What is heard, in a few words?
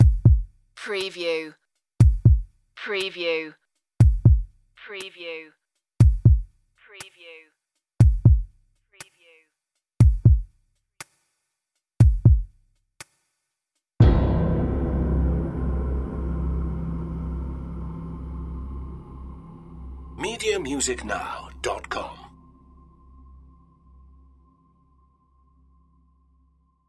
tick tock tension